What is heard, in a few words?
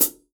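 open hi hat, Real Drum Kit, FUNK KIT; Best Service ProSamples vol.43 - Real Drum Kits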